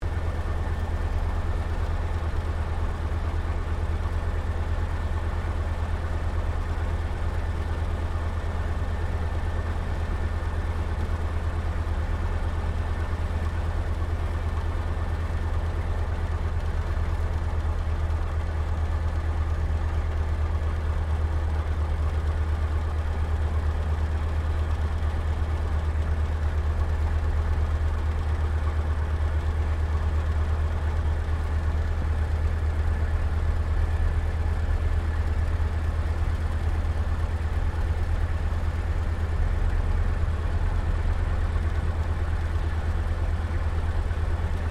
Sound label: AIRCRAFT T-28D TROJAN TAXI INTERIOR; Airplanes; Vehicles